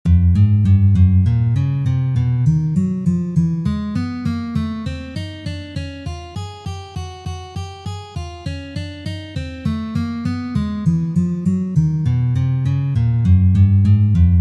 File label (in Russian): Uprazhneniya dlya gitary